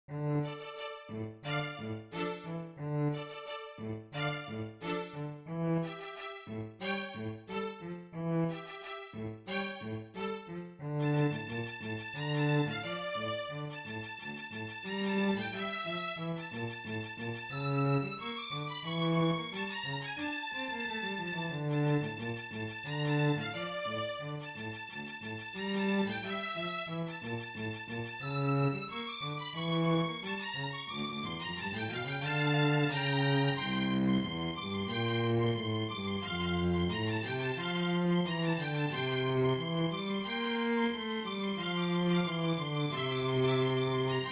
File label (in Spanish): tango para dos